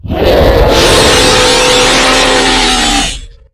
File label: eye beam, monster; Fate; MONSTERS